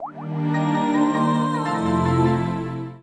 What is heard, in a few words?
bubble Shutdown; gamerip; Samsung theme for Windows XP